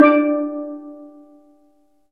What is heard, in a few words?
steel drum